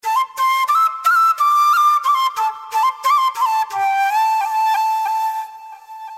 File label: flute sms